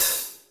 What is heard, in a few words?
HATS SEMI, Drum Kits